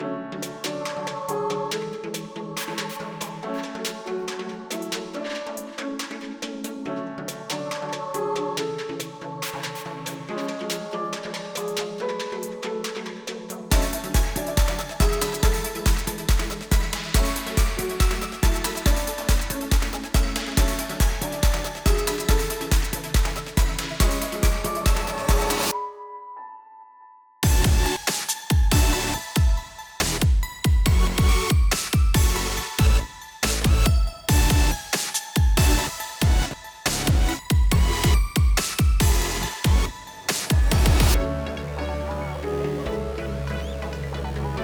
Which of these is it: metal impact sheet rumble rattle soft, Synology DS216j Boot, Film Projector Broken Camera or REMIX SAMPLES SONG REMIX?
REMIX SAMPLES SONG REMIX